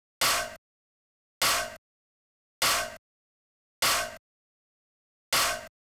133, Industrial Hit

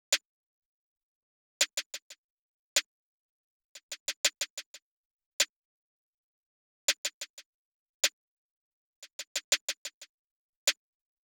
Snare

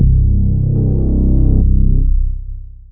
bass; seasons

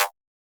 percussion glitch

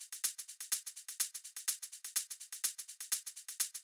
deep hihat, 125bpm

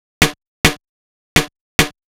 Percussion Loop, 105

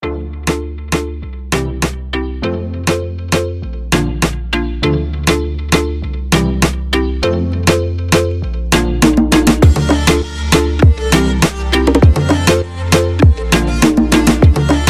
Hybrid Future Afrobeat

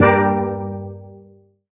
Steel Pan Chord One Shot Point Gm

